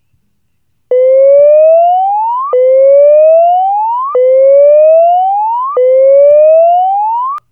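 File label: whoop, siren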